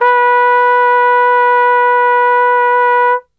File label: B 2, Brass; Best Service; Brass Super Section